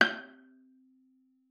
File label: G6, VIOLA PIZZ; Xsample VOL-5; 90 s Sample CDs